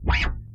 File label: STNG NOISE, PEDULLA BASS; Masterbits CLIMAX 7 - Bass